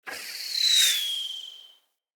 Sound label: Hunter Bow Ranged Arrow Projectile Start Fully Charged; Weapons; Player; Bows; EnvoyHunterBowRangedMelee